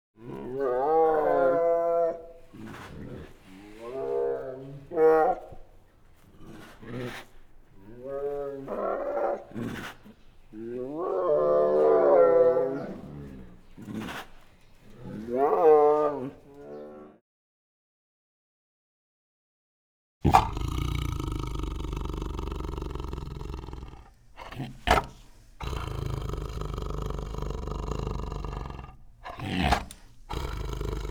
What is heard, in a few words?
Animals Birds; Sound Ideas 6003